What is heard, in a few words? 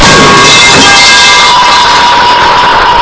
pipe falling; femur